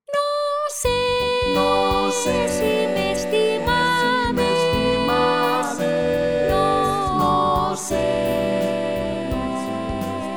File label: SATB